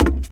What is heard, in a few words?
deck step; Golden Crayon Sounds